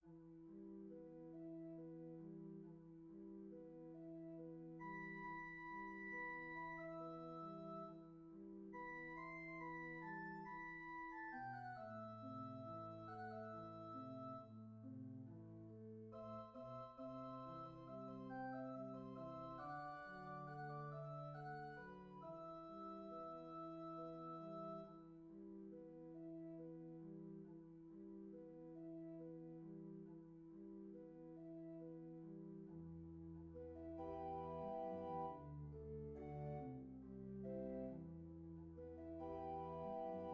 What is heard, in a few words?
Orgel